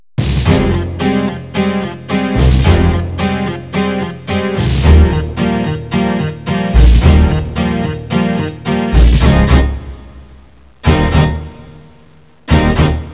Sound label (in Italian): musica newage